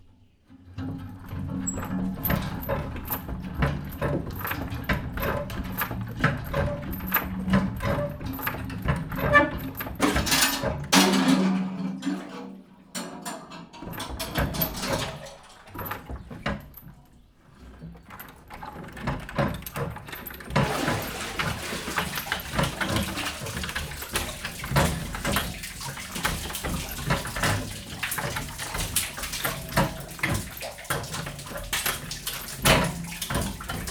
vízhúzás víz visszaöntése, kerekes kút felül zárt; vizhaz; cardioid

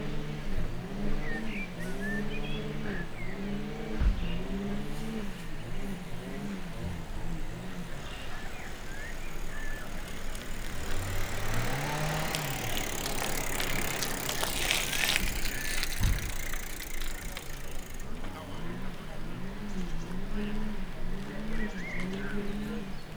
jobbrol balra, funyiro; szogliget

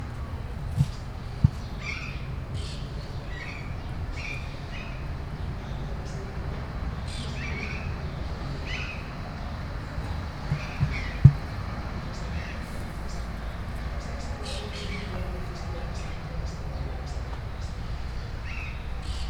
két részletben, emu; debreceni zoo 2019

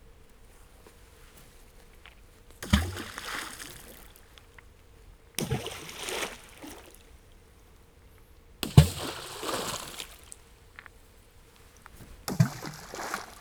mindenfelé dobott kövek víz; vizbe kodobalas 2015; vizvar; cardioid

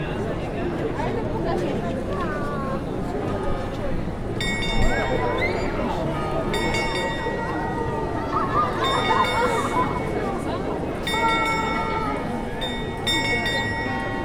orioloratorony hangjateka

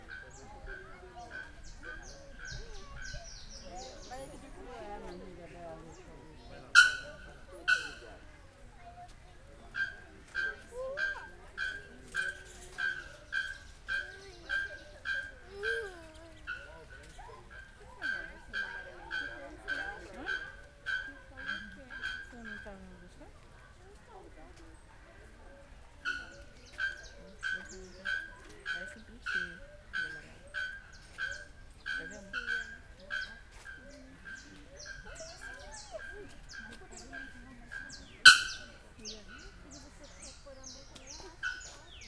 fuvolazo madar, madarpark

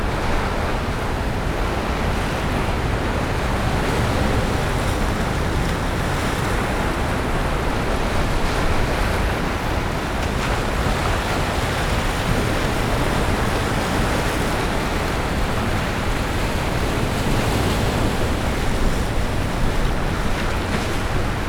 úttest mellől a kő gát tetejéről, óceán; orzola

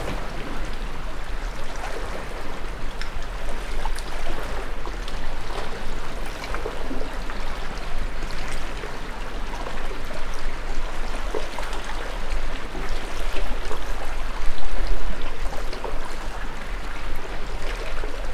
kanyon motorcsonak keltette hullamok szello

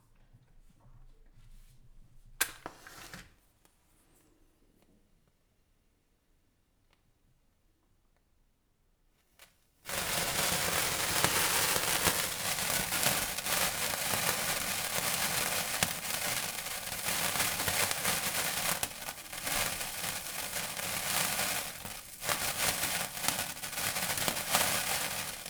meggyújtás, csillagszóró; perkupa

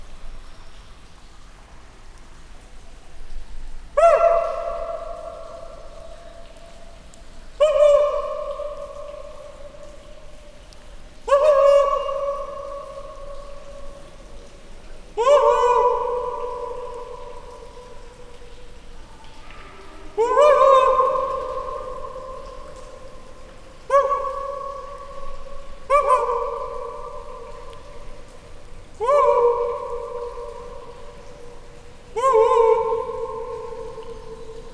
nyitott barlangban visszhang

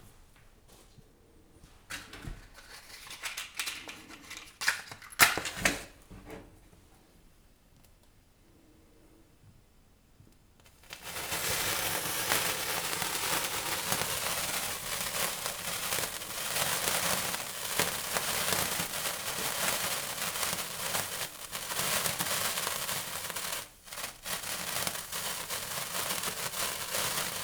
meggyujtas, csillagszoro; perkupa

